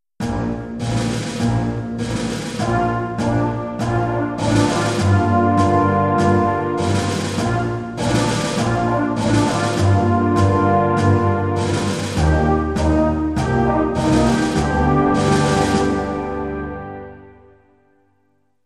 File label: Military Music Cue